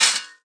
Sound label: spearman die; Immortal